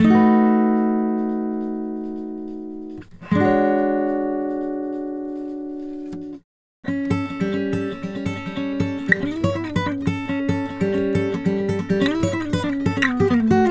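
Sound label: Country Guitar (Bridge); Digidesign; Pro Tools Essential Loops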